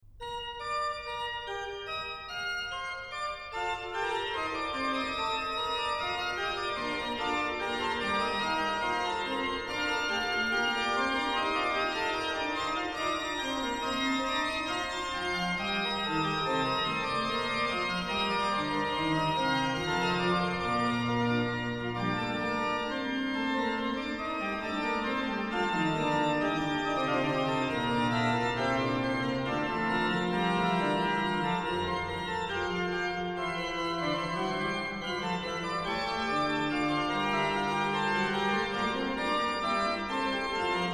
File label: Fughetta, organ; Fughetta super Allein Gott in der Hoeh sei Ehr BWV 677; BACH; BACH ORGAN WORKS; CHORALE PRELUDES